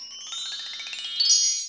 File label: wind chime; AKAI S-Series CD-ROM Sound Library VOL-5